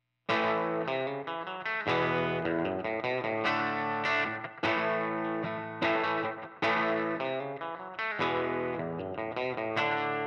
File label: Fender Broadcaster Vibro Champ Reverb volume set to 5